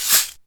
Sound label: DM5 Perc, Alesis DM5 drum machine